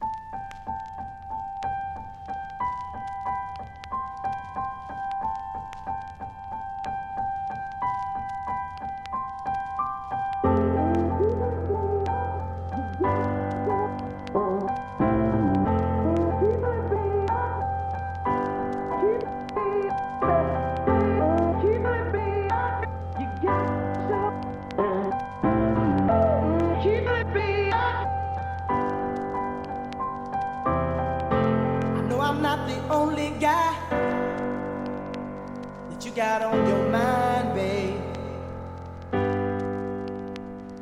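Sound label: no guitar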